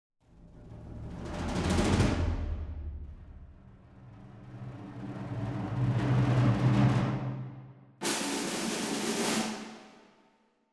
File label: Nucleus Atonal Percussions